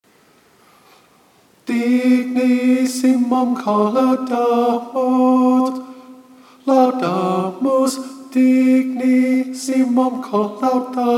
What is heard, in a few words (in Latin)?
Aufer a nobis pt 2 Tenor